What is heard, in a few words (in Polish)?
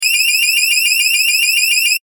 ton